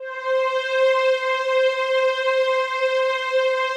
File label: PAD, ATMO PADS; Best Service; XX Large